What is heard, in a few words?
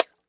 egg; Insects